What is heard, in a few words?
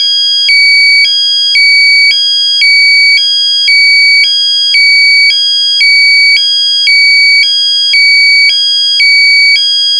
sairen